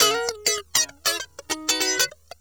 Electric Guitar

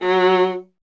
viola F; Miroslav Vitous; Solo Instruments VOL-1

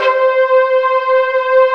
FILM SCORE; Greytsounds; Scott Peers Sound Engineering

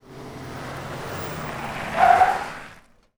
Lincoln SpinTire, Car Skids; Transportation

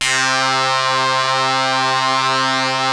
700 B-CH C 1, Korg; Back In Time Records; Korg Universe VOL-1

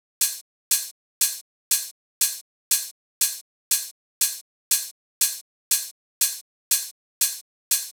Open Hihat, Happy People 120bpm D, Nu Disco; Zero-G

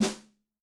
Sheet mtl, Snare; Yamaha DTXpress-II

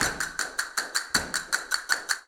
Castanets, Flamenco

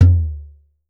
EARTH DRM, AFRICAN SET; Voice Crystal - Steve Reid Definitive Percussion

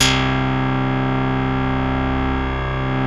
BASSES; Wizoo - Powered Wave

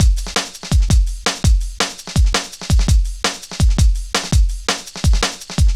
Drum Loops, Jungle, 166.5

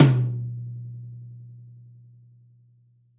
Folk Tom

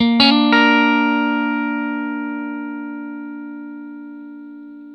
blues guitar riff 120, Vintage Blues Guitar

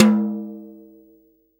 TOM, Real Drum Kit, SWING KIT

